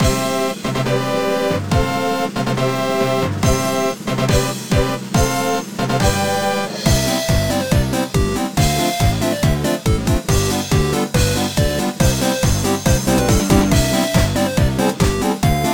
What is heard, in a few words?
Bgm circus minigame; cookie run